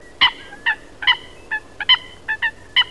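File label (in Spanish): focha comun, reclamo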